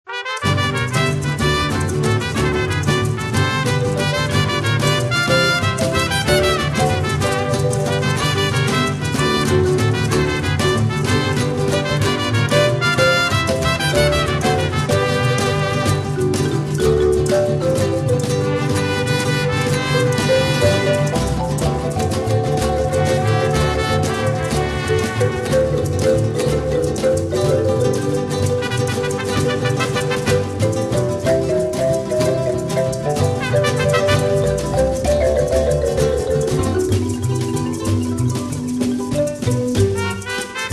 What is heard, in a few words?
Mariachi Street Music